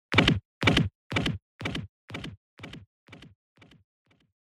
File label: Motion Fracture